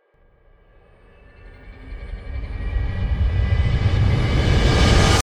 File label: Chaos Rise Cinematic Riser; Clone Drone in the Danger Zone